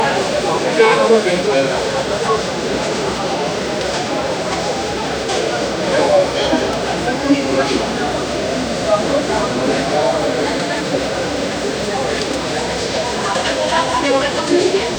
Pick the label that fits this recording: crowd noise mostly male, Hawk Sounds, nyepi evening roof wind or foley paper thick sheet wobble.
crowd noise mostly male